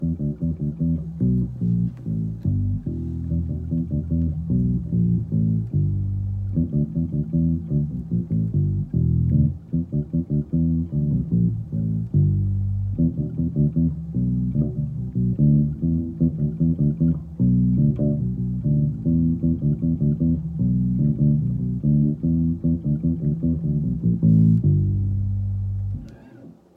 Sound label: bass